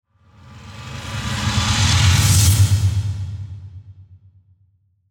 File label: Whoosh Grind Crescendo; Blastwave